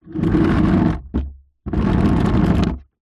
foley plastic pot tub move scrape concrete ground

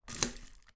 food spoon dig into bowl of cereal

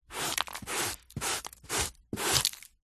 sweeping up dirt debris carpet dustpan brush; foley; zapsplat

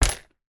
household lunchbox place down on surface

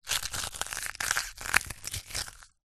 nature ice small pieces squeeze crush in hand; zapsplat

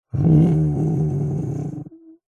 human male light moan groan quiet ill weak pain

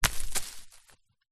foley small stone drop forest floor into leaves

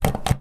industrial elevator button call press

